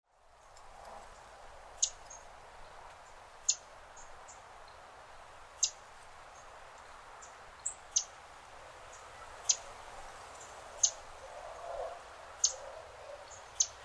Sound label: palm warbler